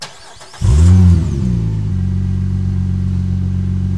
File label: Prowler Start Loop, Automobiles